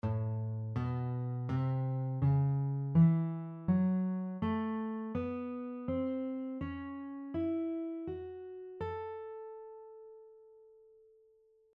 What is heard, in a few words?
A Major blues scale, guitar; The A Major blues scale has the notes A, B, C, C#, E, and F#